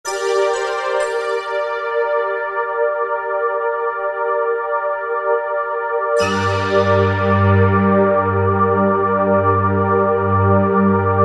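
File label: E-mu Morpheus, Atmosphere; Astralix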